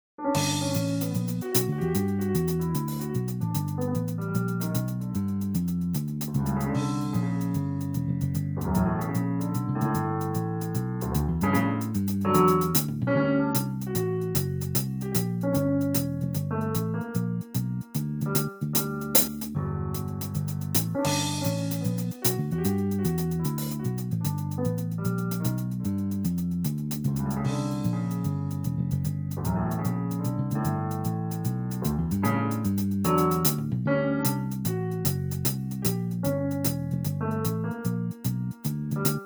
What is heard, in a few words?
Blues